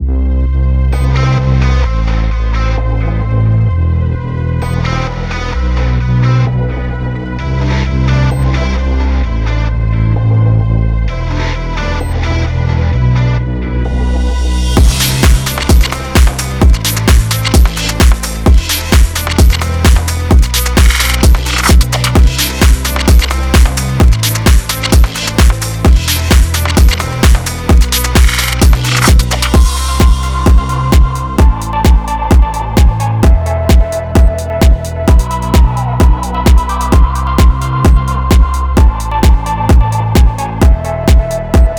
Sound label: Instrumental